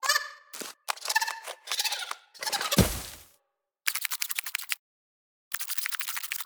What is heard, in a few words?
creature wave fall; trivalve